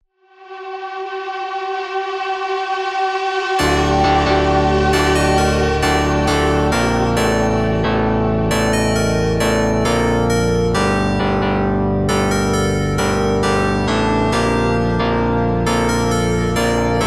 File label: super slowed reverb